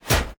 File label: plat close